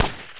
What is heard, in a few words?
Empty Trash; NoteZilla 7